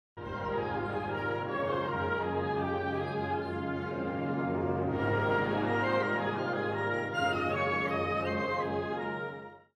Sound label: Smooth Woodwinds Theme